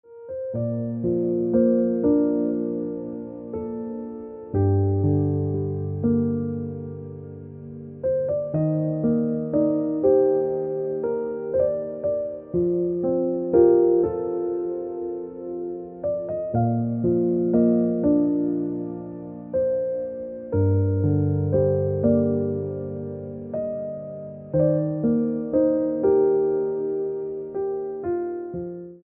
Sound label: Piano Music